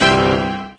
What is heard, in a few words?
Super Line Blast Created; candy crush saga gamerip